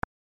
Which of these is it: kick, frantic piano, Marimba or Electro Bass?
kick